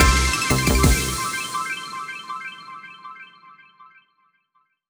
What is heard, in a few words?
Big Win End; netent